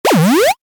Retro SFX, Retro Style, Harsh, Heavy; Tech (Sound Effects:Science Fiction)

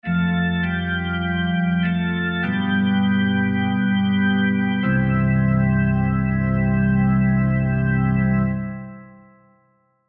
Organ Church, Organ Church Cadence Low; Keyboards; Blastwave; Sound Effects:Musical

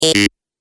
Electronic Buzzer Incorrect

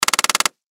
Ratchet Automatic Drill Tighten; Power Tool; Industry; Tools; Blastwave

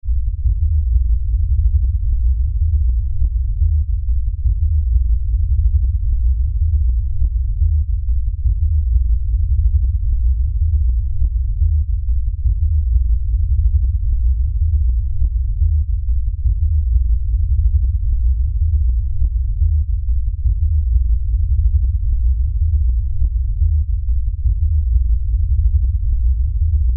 Lfe Pulse Low Bass; Drone; Sound Design

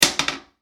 Household Toilet Lid Close, bathroom

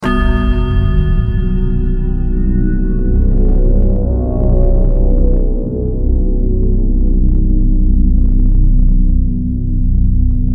Synth, Stab, Drone, Horror, 5.1; Chimes, Creepy

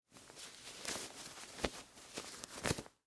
Dress Shirt Unroll, Sleeve; Foley, Clothes; Dressing; BlastWave

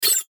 Rollover Scrape Zap Short; Mouseover; Multimedia; Blastwave

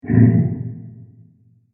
Creepy Creature Breath Transition; Scary Soundscapes; Crafted Signals

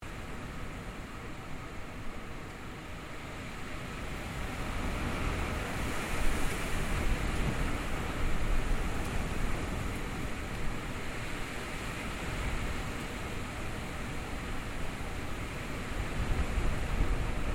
Wind storm intense loop; Weather

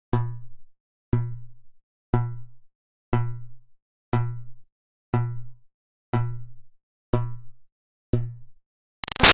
Bounce Cartoon Plucked Many; Cartoons; Bounces

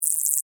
Insect Voice Chirp, Vocalization, Bug; Animal

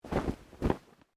Clothing Shirt Cotton Move

